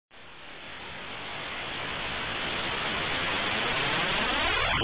Rocket Sound; Acorn on the Moon

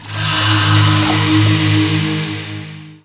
shrine; Heretic II Demo; items

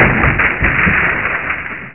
WOOD; vcop

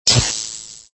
rollover circle nav; Doom 3 - Resurrection of Evil; Xbox

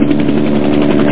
chopper; PaintIt

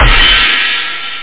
Pin Cymbal; MarcDrive

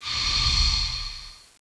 deflect; Renaissance

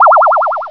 Squish; Skittles 1.0.1

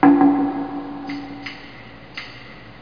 Robo Watch

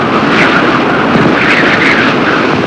whipping wind; Sound Effects for Multimedia - Volume 1

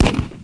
battle; batword

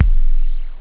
tone, drums techno; OctaMED SoundStudio V1

